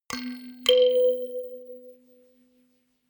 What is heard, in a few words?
notification kalimba rattle octave up